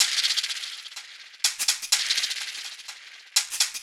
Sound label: shaker D